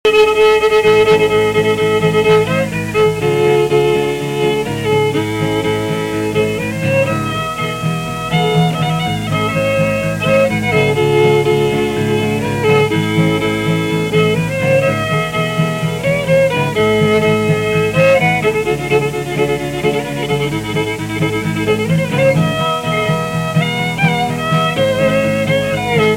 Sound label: Fiddle